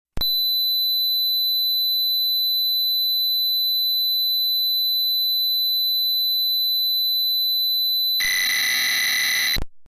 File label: modem for next beep; calculators; el-5500; computers